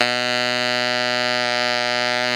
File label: TENOR B 2, Saxophones; Greytsounds; Philip Wolfe David Crozier's Saxophones